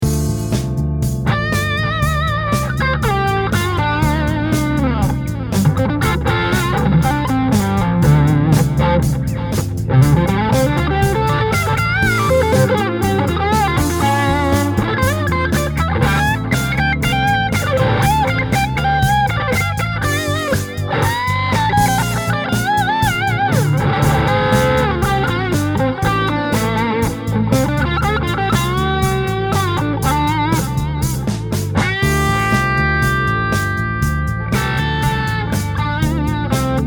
Rock Blues; Mercury Box; PRS

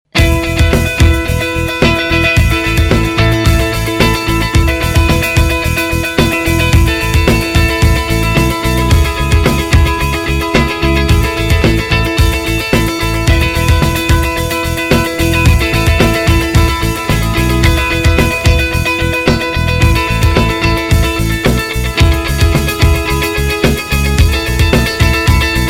Clean style

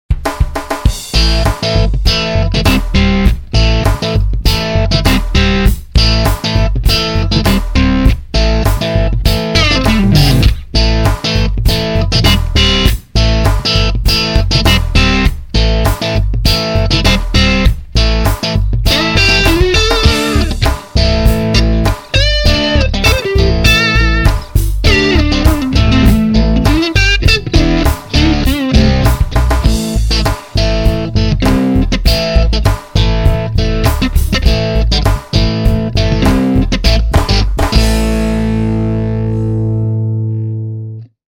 Crunch Strato

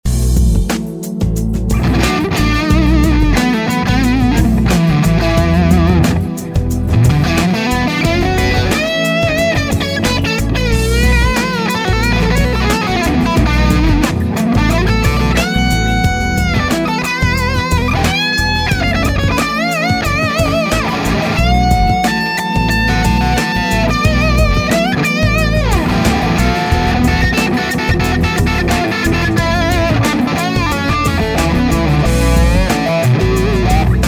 Lead Plexi

